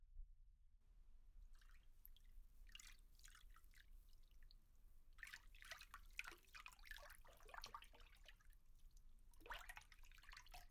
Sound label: full kitchen sink